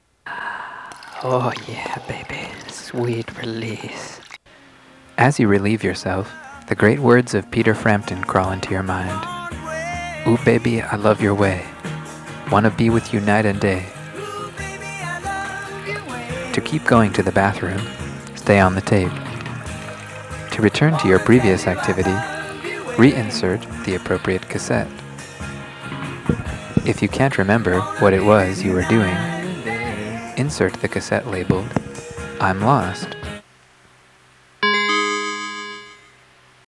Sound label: Cassette